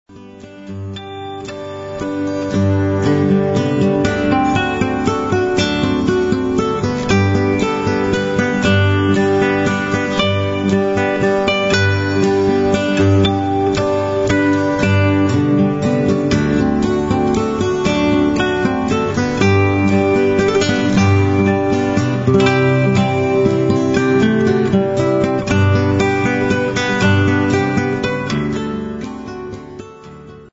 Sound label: Spanish Fandango